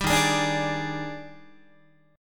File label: Eb Major 11th, Guitar; EbM11; strummed; JGuitar